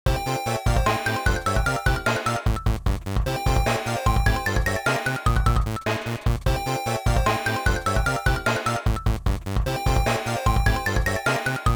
Submarine

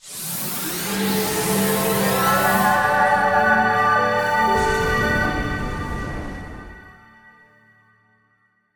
Rose Pickup

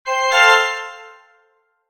objective complete; heretic operative

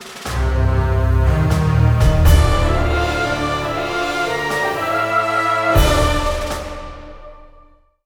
Round Start; Zombie Stalingrad

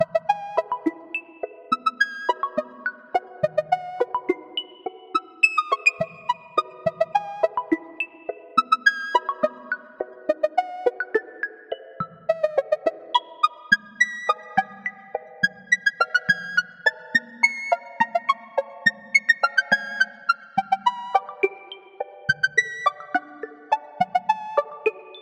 Jubilator Music; We Happy Few

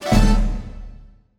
jingle combo start; Seven Seas Solitaire

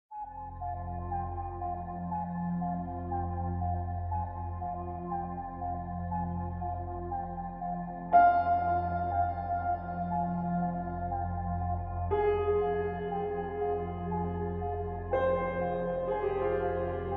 Map Music; A Bloody Night

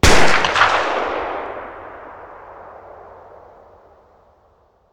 Shotgun Fire

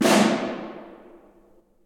Snare

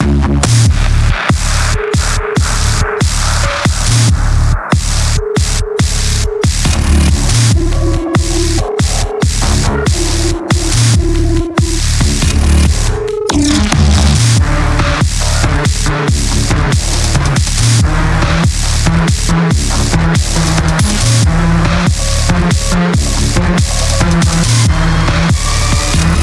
heavy; Doom; VEGA Core

